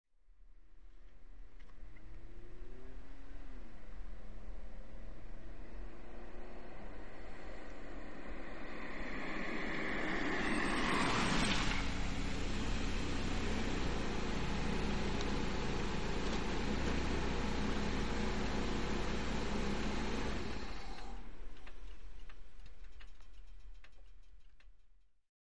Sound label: Nissan Sentra Stop Id, compact